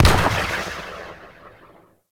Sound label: Multi Gun Overcharged Explosion; Enemies; Abilities; Warframe; Corpus